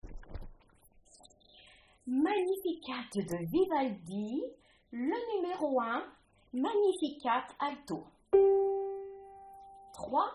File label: Magnificat Alto; Vivaldi